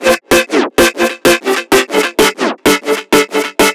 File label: synth 128 Cm; Electro; Anthemic; synth loops